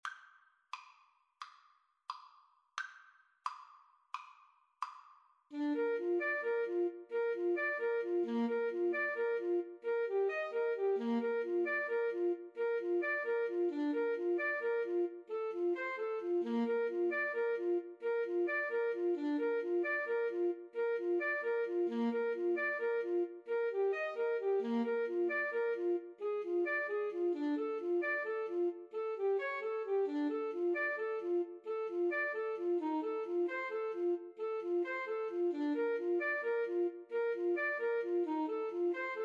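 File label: o holy night, alto saxophone trumpet, Trumpet Saxophone Duet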